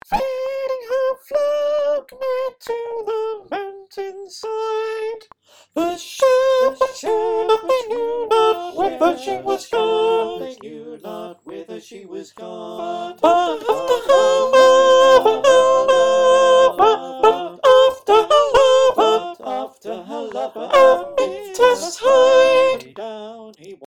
sop, choir

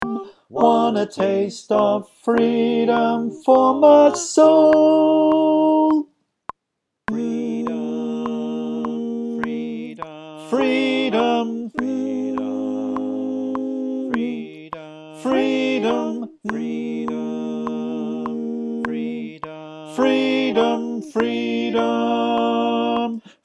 Tenor click track

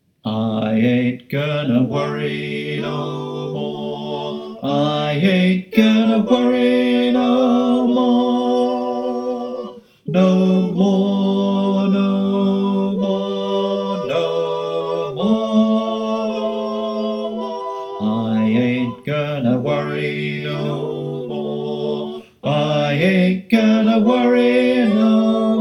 bas

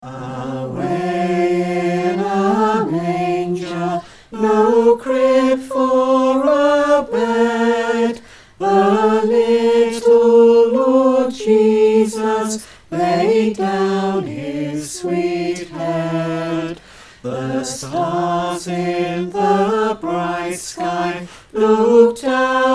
soprano, choir

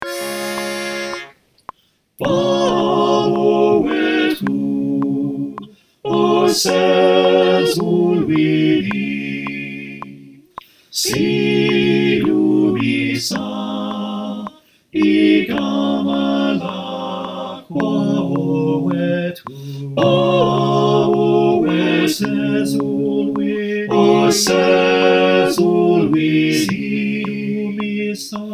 SATB and Call with click

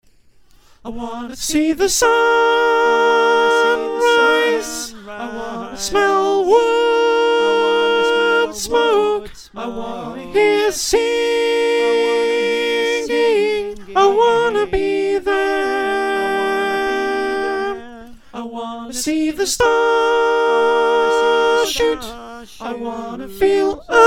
soprano, choir

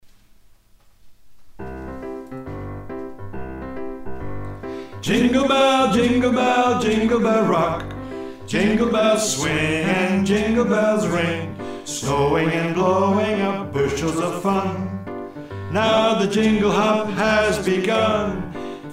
Singalong Track

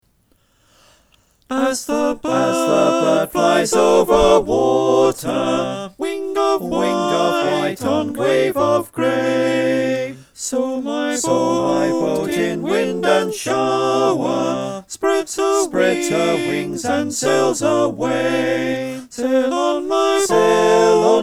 SATB

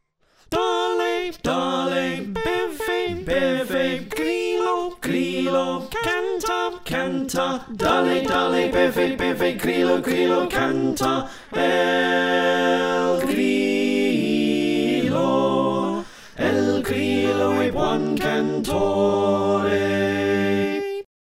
Slow SATB, choir

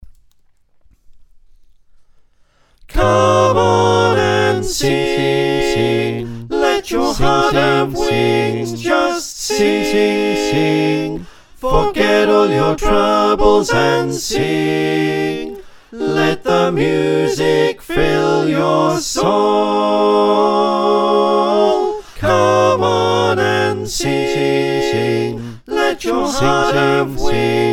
SATB, choir

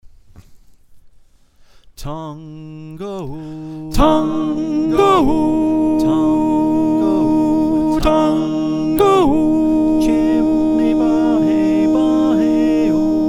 Soprano, choir

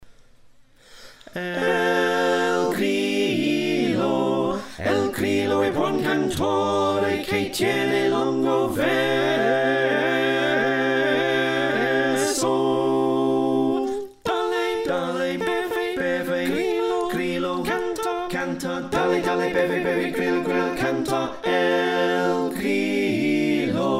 SATB, choir

